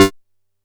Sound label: G3, Bass; E-MU Producer Series Vol. 6 – Analogia Project; Boom da Bass